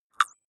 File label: click synthetic squeak, signale